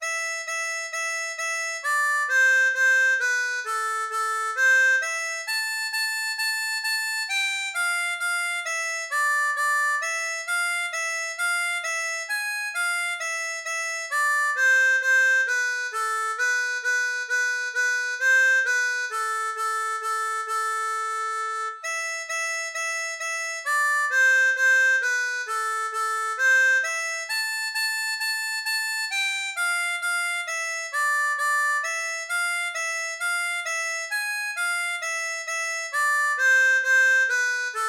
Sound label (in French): Romance espagnole, harmonica